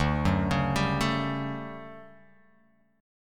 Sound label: D Major 11th D down Guitar Open F; arpeggiated; JGuitar